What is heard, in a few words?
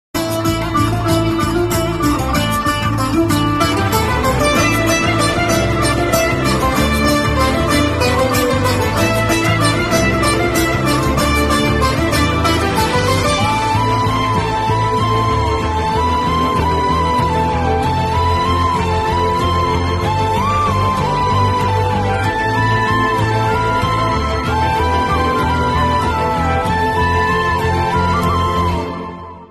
song bgm telugu sad